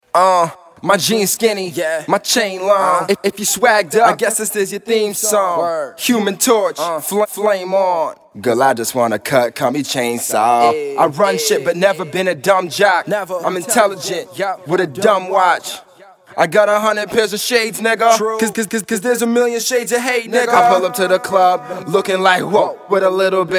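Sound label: hip hop Rap 100 E flat minor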